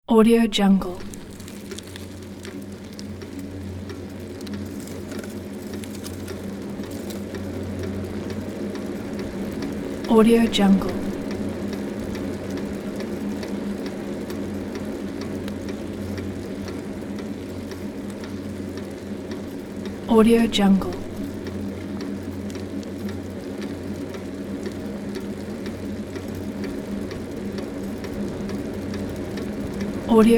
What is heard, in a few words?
road bike start ride and slow down; sound ideas